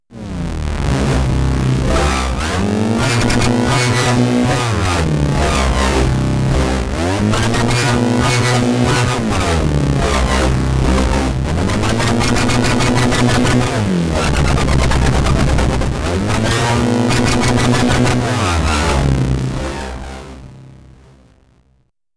Anamono Xmini one Voice OSC2 SUB FM; Gotharman

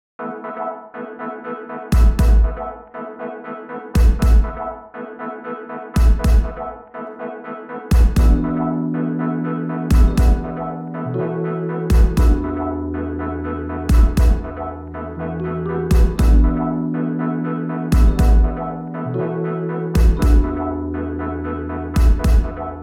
Plate Reverb; Tracktion

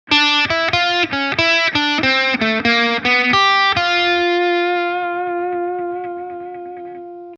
G7 Twister mit b5

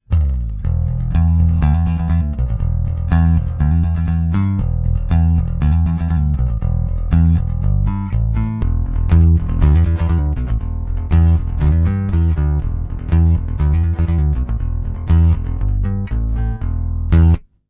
Speaker Out Rickenbacker neutral; Bugera AVALON

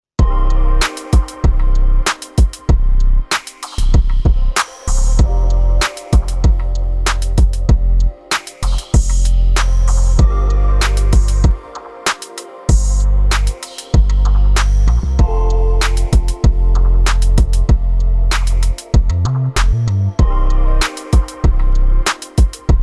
LoFi Sunset

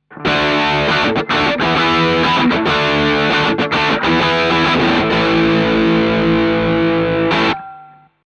Shure SM7B Guitar Amp Crunch; API 512c

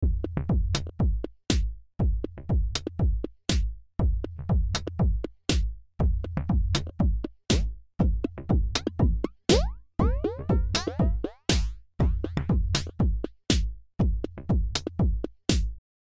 Flanger

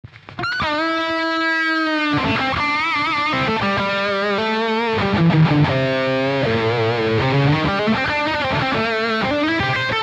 Lead Amp; Neural DSP Archetype Rabea

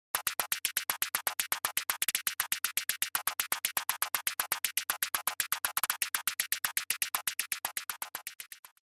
FM Hat Feedback; Kobol

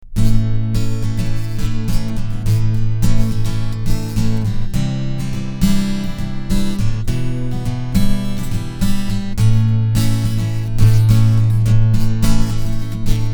Strumming Piezo

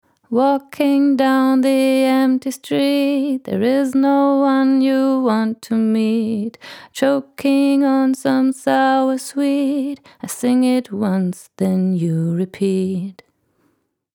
Female Vocal; Tegeler Audio Vocal Leveler 500

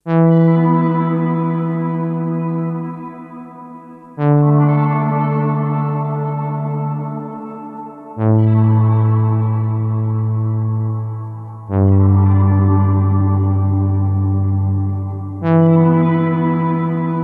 Synthesizer Arpverb; Boss RV-200

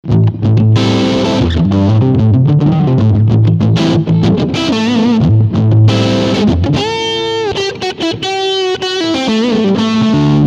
Pure Blues

